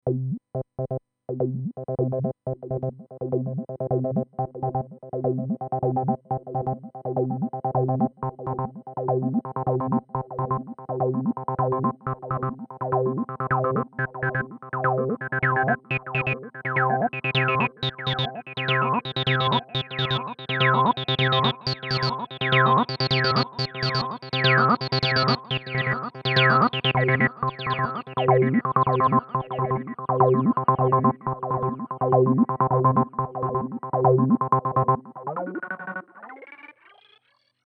Roland TB 03 Tape Echo

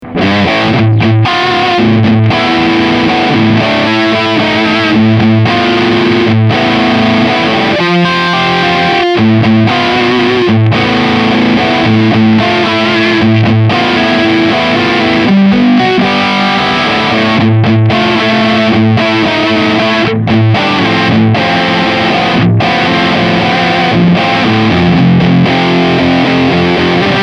BS Riff

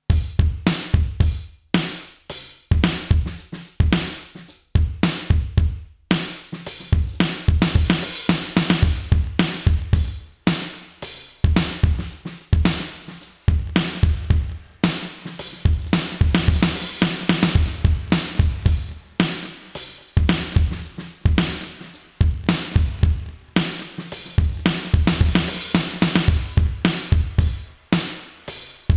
drums

